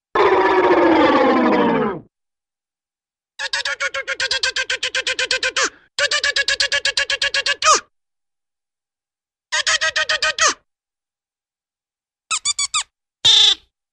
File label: Small Group Of Men Razz Angry Razz; Hollywood Edge Cartoon Trax